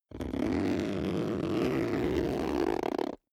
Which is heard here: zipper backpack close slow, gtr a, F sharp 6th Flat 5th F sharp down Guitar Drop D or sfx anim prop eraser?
zipper backpack close slow